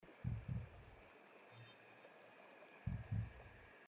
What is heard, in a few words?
reviving; hyper light drifter